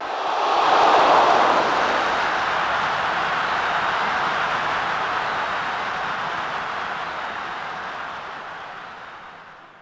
near miss

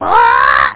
squak

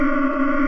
ROT SCAN; lander

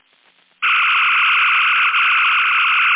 Phaser; Psion

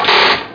arm; megaslot

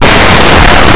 books; Blue Heat

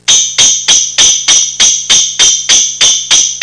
sleigh bells; Sound Effects for Multimedia - Volume 1

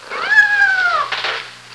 kitten; pets; Sound Effects for Multimedia - Volume 1

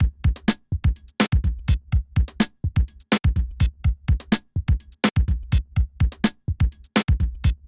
drum; bombogroove 10

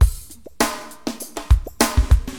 LOOP, HipHop Drums; LiveAct Pool; AcidJazz Funk

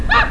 ahhH! (hurt hi); Renegade; Shelly Merfly

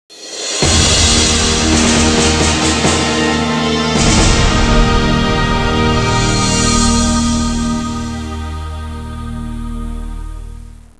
music; Babylon 5 - Entertainment Utility

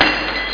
Bling; Mosaïcon

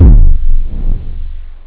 thud, synth bass

